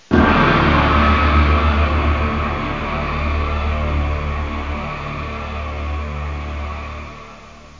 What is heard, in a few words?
gong